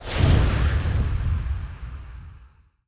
Frenzied Explosion

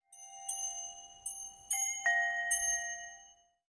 Ambience Scatter Chimes; Santuario

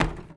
Footstep Plastic Tech

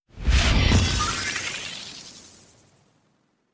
Enchant Absorption Cast; Legend of Keepers

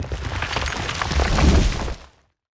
Shake Prepare

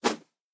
Dummy Attack

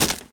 Spikes Break

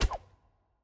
Amazon Attack Shoot

